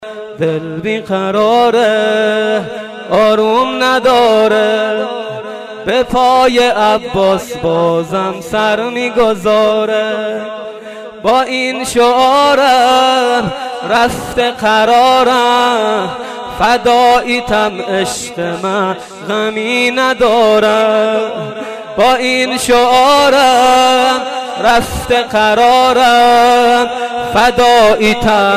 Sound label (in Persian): Sine Zani